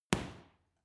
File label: boxing punching bag side hook medium; sport freizeit; boxen